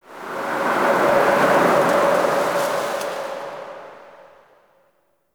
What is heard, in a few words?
Exterior Forest Wind; Warframe; Ambience; Duviri